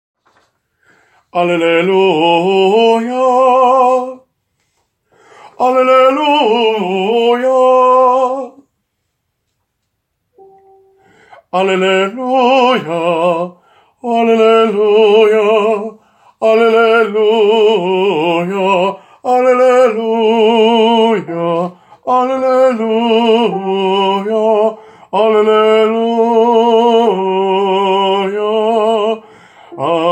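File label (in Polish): Alleluja BAS, choir bass part; Mawby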